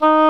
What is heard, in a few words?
Oboe, Orchestral Winds